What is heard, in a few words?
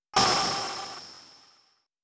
abbrasive bright electronic stab